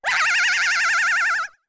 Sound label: Fall; Deku Link's screams while falling; Zelda